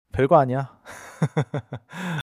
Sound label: laughter